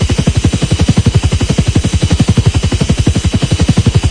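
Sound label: ambience Helicopter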